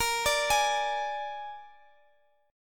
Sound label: G sharp Suspended 2nd Flat 5th, guitar